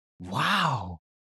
success Extra; HeavenStudio; first Contact

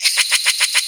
laugh; HeavenStudio; sneakySpirits

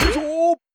NPC big ball; HeavenStudio; working Dough

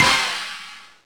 goal; HeavenStudio; bouncy Road

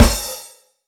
hit; HeavenStudio; drummingPractice

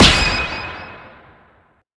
bomb hit; HeavenStudio; karateman

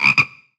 frog; HeavenStudio; tambourine

